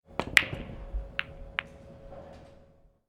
pool table shot

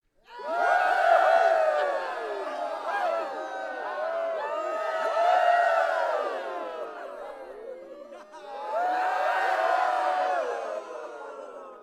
battle crowd celebrate stutter

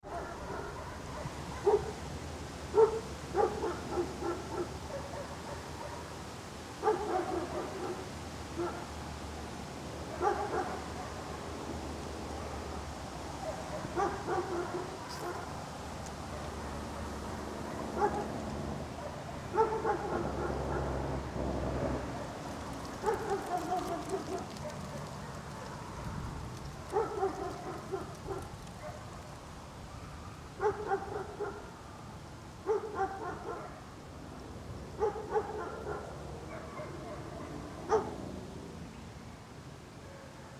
outdoor ambience dog barking

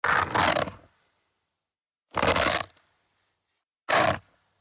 skateboard skid stop series

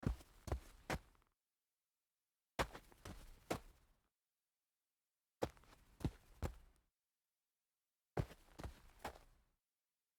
footsteps dirt

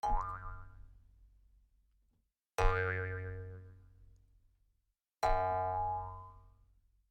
goofy spring bounces

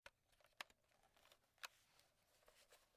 button push, office

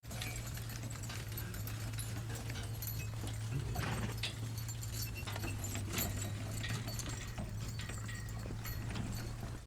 horse team

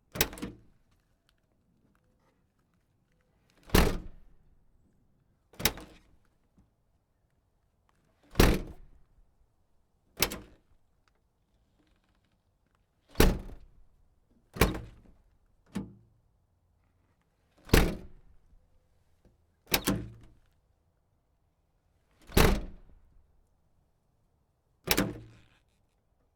suv door open and close

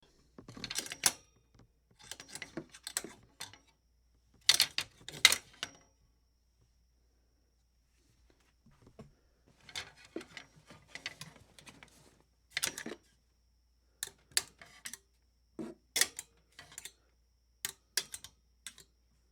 hanger on metal

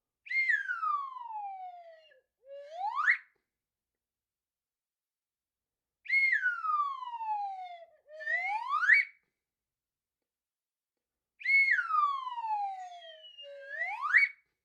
whistle toy

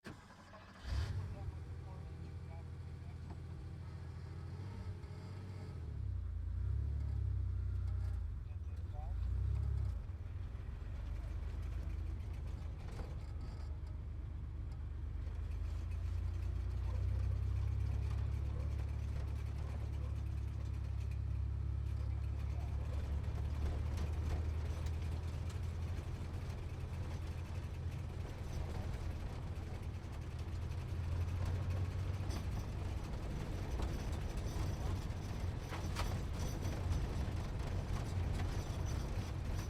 sports car driving low rumble

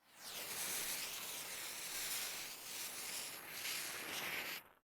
lit fuse

